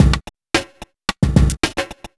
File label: evil beats 110